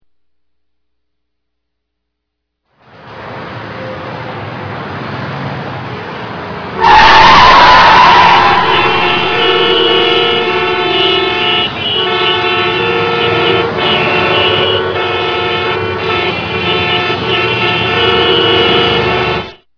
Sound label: traffic; Machines